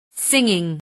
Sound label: singing